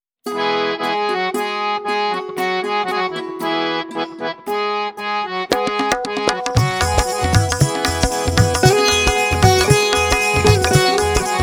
Ladies Karaoke E Pancham

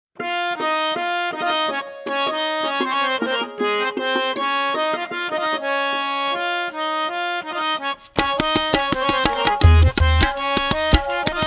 Ladies Karaoke C Sharp Pancham; Jai Durga Lakshmi Saraswati

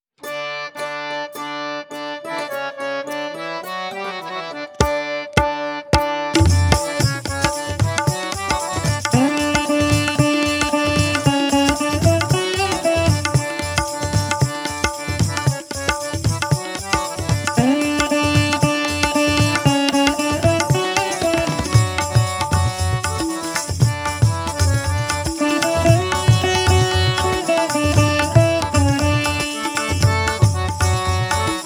Ladies Karaoke D Pancham

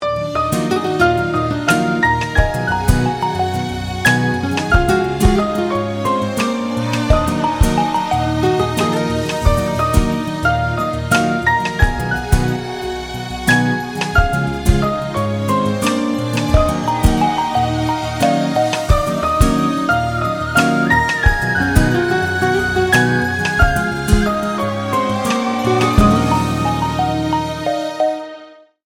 Ringtone Birthday Theme Android

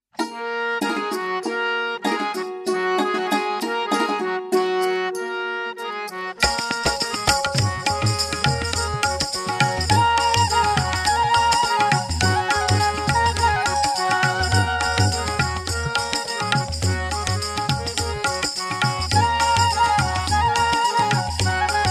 Gents Karaoke F Sharp Pancham

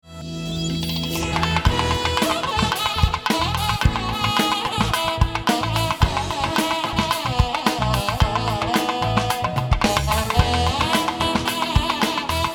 Bhajan ringtones; Sri Sathya Sai

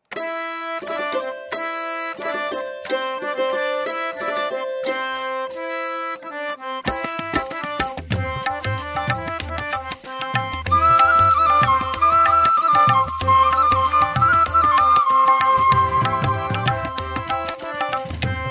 ladies karaoke C pancham

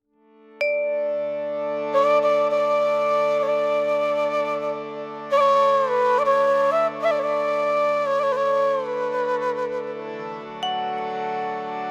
karaoke D pancham; Asato Maa Sadgamaya

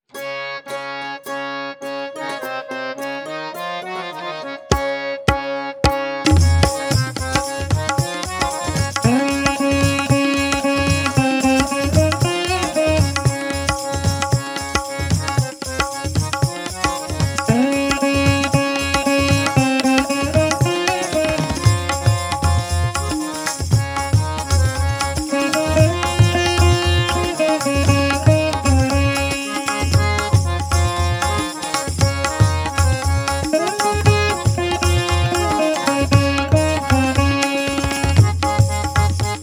Ladies Karaoke C Sharp Pancham

